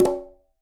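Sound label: Quinto A Flam Open, Conga; Latin Percussion; Drums; Drum Hits; Ableton; Factory Packs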